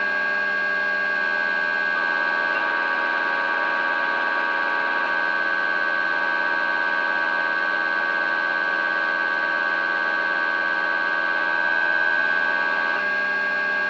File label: Evil Radio